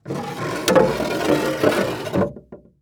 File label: Impact Crate Drag Wood Crate On Boardwalk; Impacts